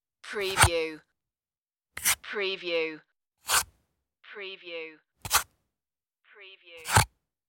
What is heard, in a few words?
take ring from casket